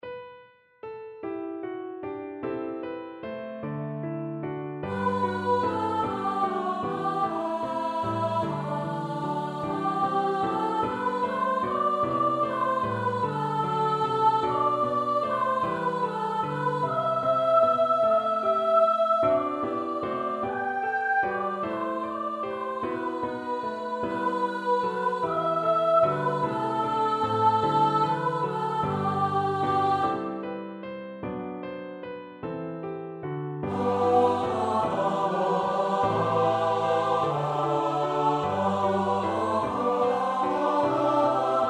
S-A-T-B, koorpartij oefening

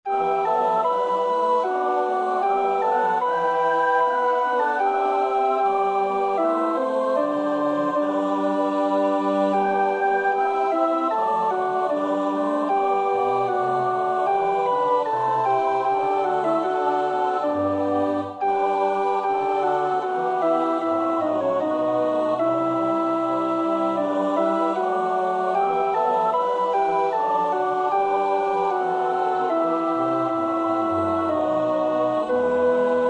alt, koorpartij oefening; Bach (Johann Sebastiaan); BWV 198

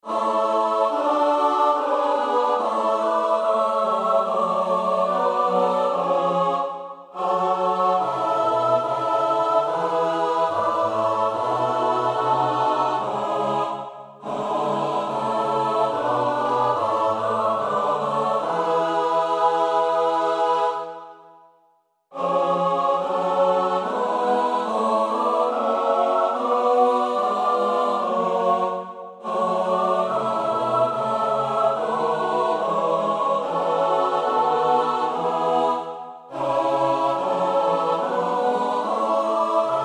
S-A-T-B, koorpartij; Bach (Johann Sebastiaan); BWV 245 Johannes Passion

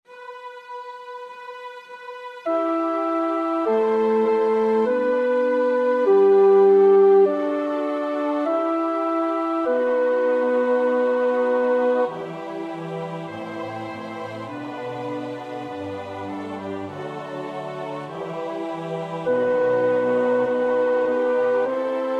alt, koorpartij oefening; Schütz (Heinrich); Koordelen SWV 279

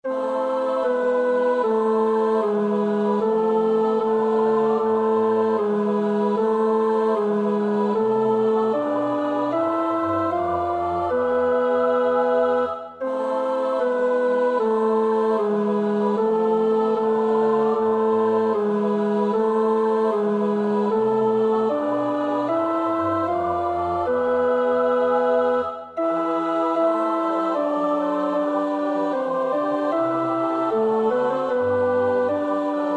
tenor, koorpartij; Bach (Johann Sebastiaan); BWV 162; Ach, ich habe schon erblikket

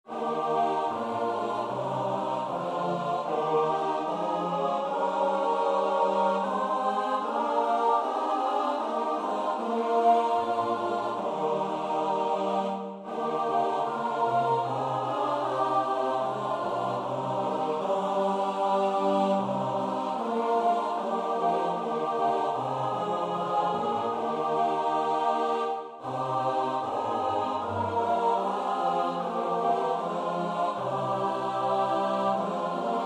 S-A-T-B, koorpartij oefening; Bach (Johann Sebastiaan); BWV 159; Jesu, deine Passion

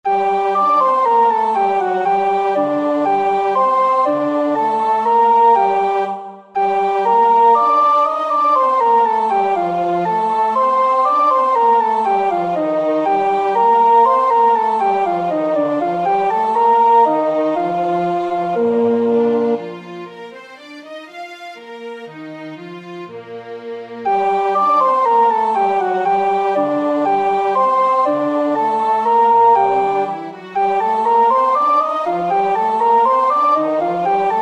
Deposuit potentes, sopraan; Vivaldi (Antonio); Magnificat (RV 610)

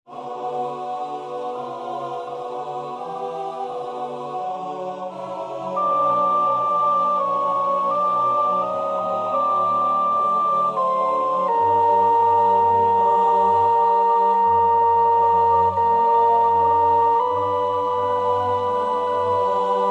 alt, koorpartij oefening; Bruch (Max); Moses op.67

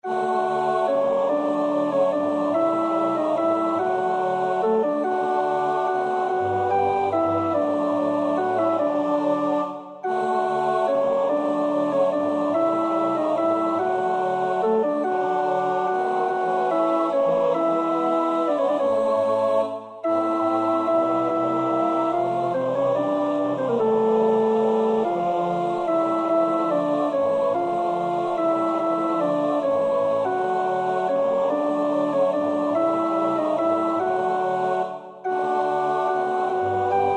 tenor 1, koorpartij oefening; Schubert (Franz); Vier Gesänge für vier Männerstimmen, Op. 17

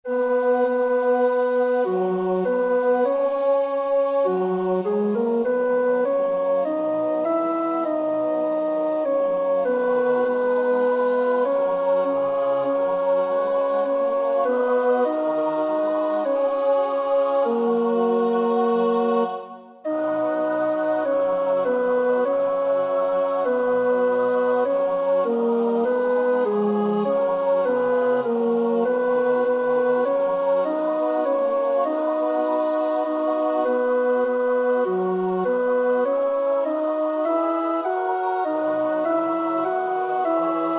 tenor 1, koorpartij oefening; Thiel (Carl)

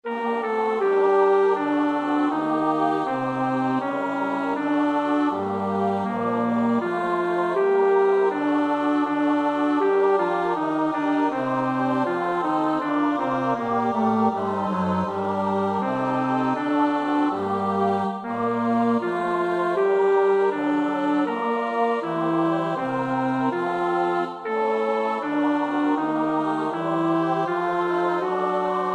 bas; Bach (Johann Sebastiaan); BWV 040; 3 Die Sünd' macht Leid

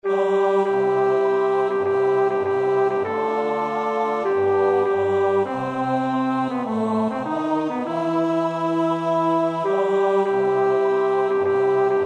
bas 1, koorpartij oefening; Schubert (Franz); Vier Gesänge für vier Männerstimmen, Op. 17

